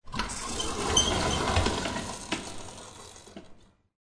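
sliding door open, window open close, open door close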